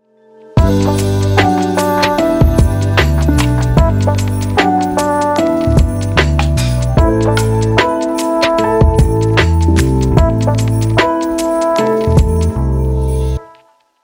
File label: chill christmas vibes